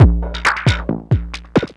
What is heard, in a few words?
Drums Strange